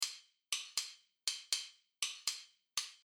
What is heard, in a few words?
shuffle